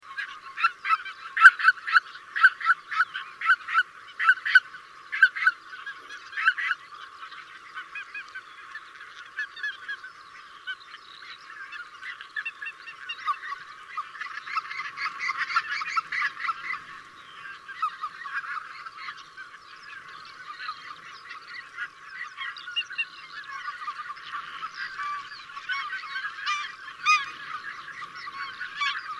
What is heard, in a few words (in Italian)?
Oca Lombardella